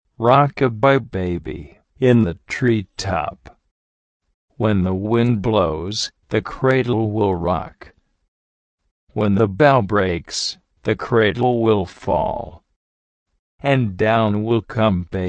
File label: lullaby